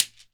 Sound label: SHEKERE, Synclavier Percussion, World & Orchestral; ILIO; Synclavier Percussion 2